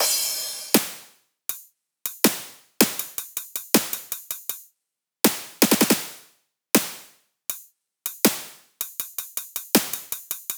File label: Dry, 80bpm, Top Drum